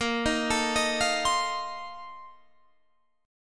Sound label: Bb Major 7th Suspended 2nd Suspended 4th; arpeggiated